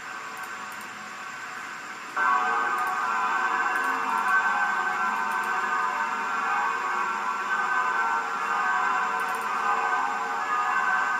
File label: Bell; medjugorje 2016